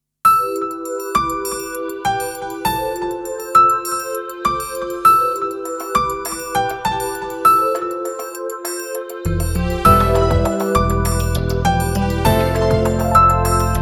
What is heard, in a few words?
Turbulent Atmosphere; happiness bgm